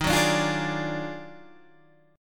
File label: Eb Minor Major 11th, Guitar, Standard; EbmM11; strummed; JGuitar